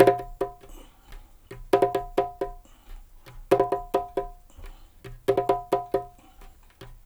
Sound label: 135 BPM, perc loops, dub; Drumdrops In Dub VOL-1; 130-140 BPM